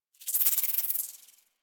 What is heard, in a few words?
Foliage Insect; Warframe; Duviri